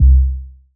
C bass; ButtaBassa

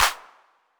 Clap B 808, Hand Clap, Clean, Digital

